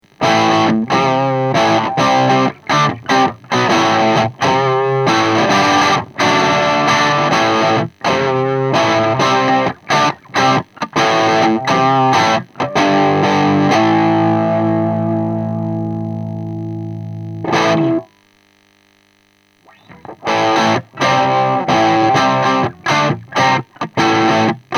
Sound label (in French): SH4 tone, Canal 2, SH4 position bridge, crunch; White Jack, un 3 canaux; AMPLI